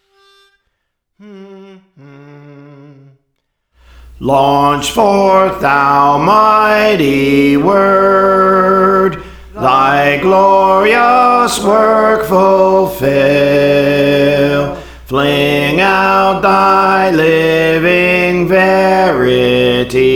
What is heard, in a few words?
Bass; Praise For The Lord Training